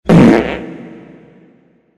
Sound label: Dramatic fart with reverb; meme